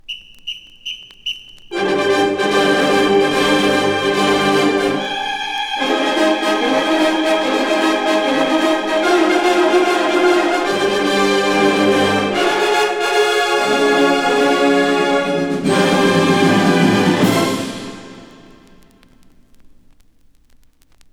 American Salute Fanfare, The Gator Band At Half-Time